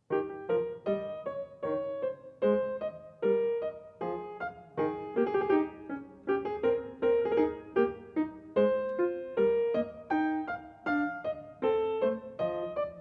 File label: E flat menuet